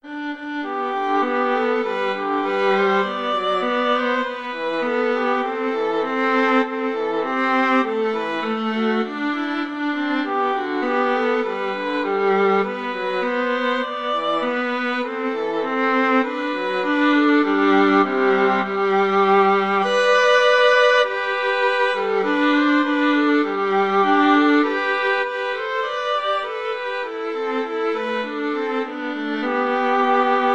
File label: simple gifts, violin viola duet